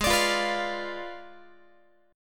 Ab Diminished 7th Ab, Guitar, 4A, 4E, 5A, 5D, 5G sharp, 6C sharp; Abdim7; strummed; x,16,17,15,15,16